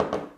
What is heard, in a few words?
cup on table